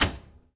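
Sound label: DOOR Oven Close; mono; Universal Sound FX; DOORS & GATES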